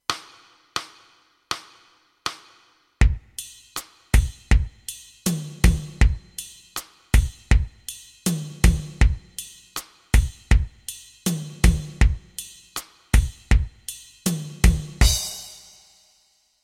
latin rhythmus; latin rhythmen 1-32

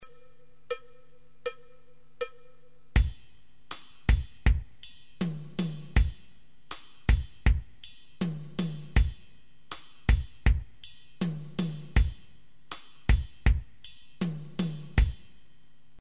latin rhythmus; latin rhythmen 1-32

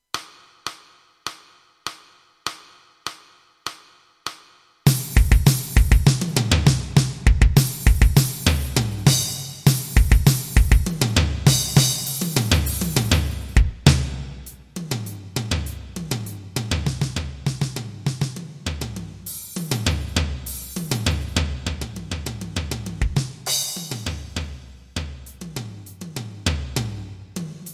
schlagzeug etuede; schlagzeug stuecke etueden

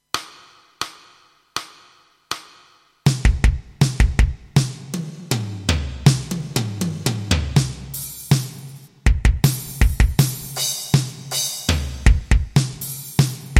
schlagzeug etuede; schlagzeug stuecke etueden

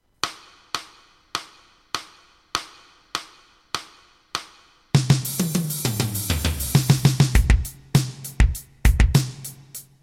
schlagzeug etuede; schlagzeug stuecke etueden

